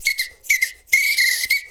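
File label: foudang groove; Best Service; World Colours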